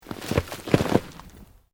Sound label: Earth Loose Move Short; Material components for constructing more complex sounds; Debris; Materials